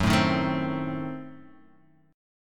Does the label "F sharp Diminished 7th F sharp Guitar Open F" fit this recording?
Yes